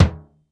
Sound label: LOOSE KIK, JAZZ; Hollywood Edge; The Big Bad Beatmachine